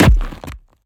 creature attack impact heavy; Bluezone Corporation; Organic

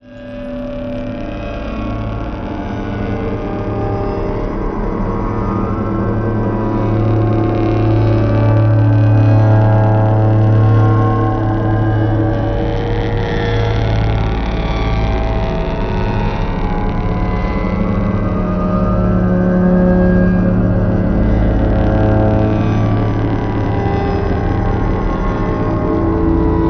ambience synth atmosphere, scary; Bluezone Corporation

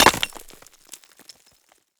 mountain dweller tool pickaxe impact small dust; video game; Bluezone Corporation

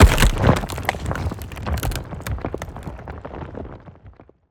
creature wood texture crack heavy rumble; Bluezone Corporation

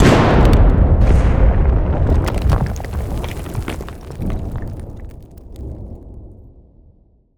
explosion debris rock; Bluezone Corporation

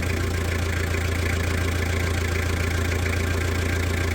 excavator engine; simpra

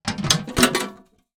cartridge case handling; Bluezone Corporation; Steampunk

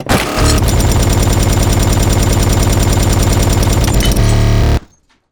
mech weapon gun; annihilation; Bluezone Corporation

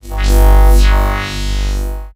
energy, science fiction; Bluezone Corporation

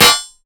mountain dweller tool anvil impact; video game; Bluezone Corporation

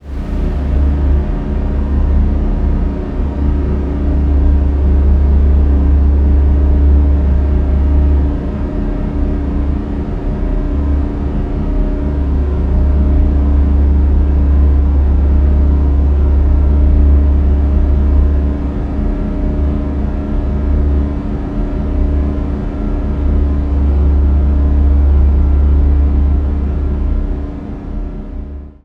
black project cinematic ambience; Bluezone Corporation

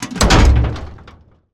annihilation mech mechanical texture; Bluezone Corporation

